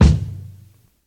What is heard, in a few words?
thumpy kick drum sound G key